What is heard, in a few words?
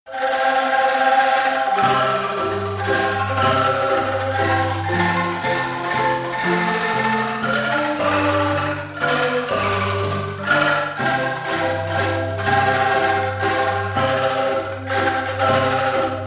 Burung Kakatua, angklung